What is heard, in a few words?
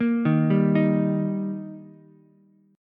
G Minor Sharp 5th G Ukulele, chord; arpeggiated; JGuitar